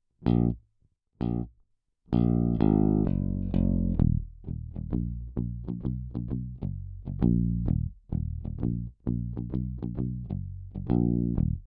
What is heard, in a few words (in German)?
E Bass